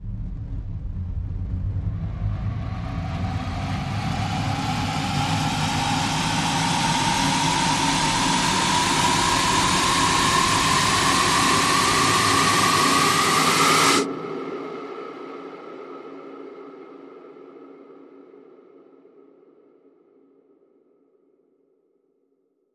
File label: Rise Long